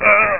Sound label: die; zombie